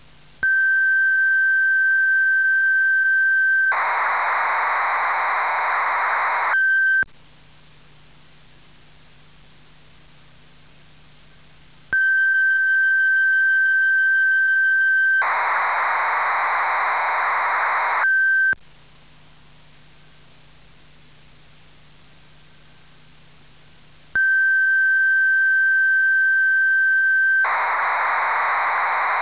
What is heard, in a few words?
Preloaded Sound Data of Poly-61; Korg Poly-61M